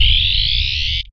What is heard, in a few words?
shield